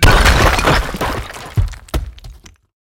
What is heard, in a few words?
fence stone crash